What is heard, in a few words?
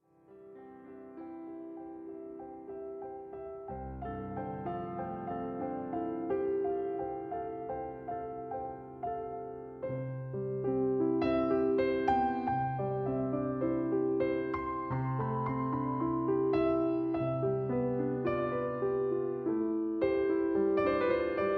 Solo Piano